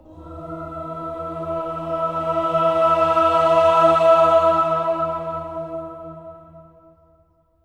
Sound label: OCT B3, SWELL OCTAVE